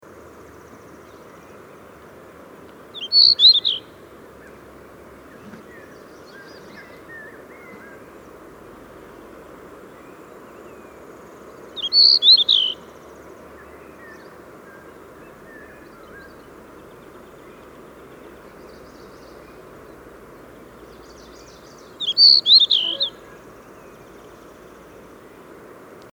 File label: Rufous breasted Bush Robin Tarsiger hyperythrus, rufous breasted bush robin call